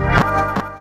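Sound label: chord stab; E Lab; XTc Files of House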